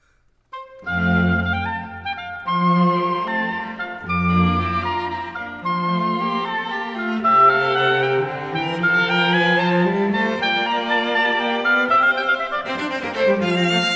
Quartet For Oboe, Violin, Viola and Violoncello in F Major Rondeau; Allegro; Chamber Works For Winds And Strings